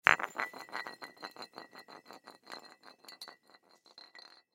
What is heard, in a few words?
Light Bulb Drop No Break Roll On Cement; Lights; Foley; Blastwave